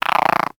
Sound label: Exterior Random Critter